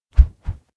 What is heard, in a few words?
kigurumi wave; awayuki; anemoi パッケージ版 1.1